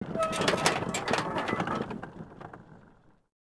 Artillery Run End; Civ4 beyond sword

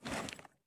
prone asphalt; Escape from Tarkov

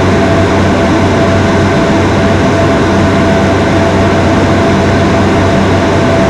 BTR80 engine max; DCS

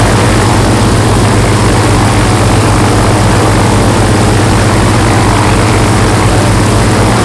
side, engines; War Thunder

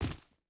footstep burlap gravel jump land; Rust

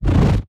damage hits explosions, Warships

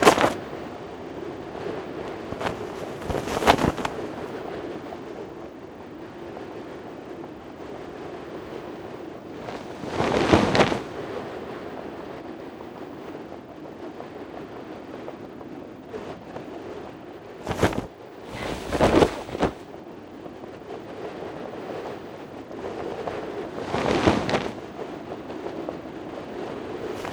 cloth flap tent

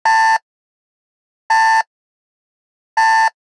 tank burning alarm; tank breakdown; World of Tanks Blitz

